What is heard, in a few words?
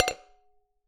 Tap Glass, Medium Wine Glass